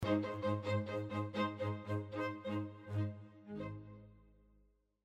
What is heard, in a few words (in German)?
streicher quantisierung